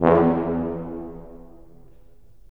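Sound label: brass tuba; LA Composer vol. 3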